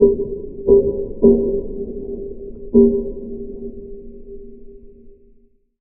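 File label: Random Ambience; moul; Eng Hut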